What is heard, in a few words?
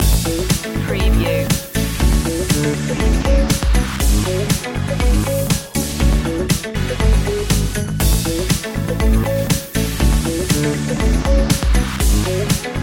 party train loop